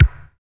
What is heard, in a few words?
BD, BD Dance